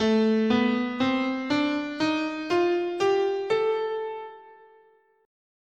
A Locrian sharp2, scale

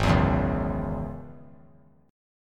Ab 7th Suspended 4th Sharp 5th Ab down; Ab7sus4#5 strummed

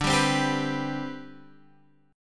D 13th Suspended 4th D; D13sus4 strummed; JGuitar

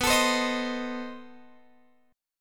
B 7th Flat 9th B Guitar Open F; B7b9; strummed

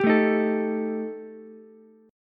G Suspended 2nd Sharp 5th G Ukulele; Gsus2#5 strummed; JGuitar

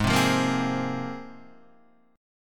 G sharp 6th Add 9th, Guitar, Open D; down; chord shape

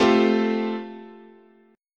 A Minor 7th Sharp 5th, chord, guitar

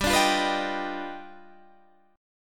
G 7th Flat 13th; G7b13 strummed; JGuitar

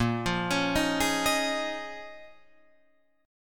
A sharp Minor 11th, Guitar, Open F; 10,12,13,10,11,10; arpeggiated

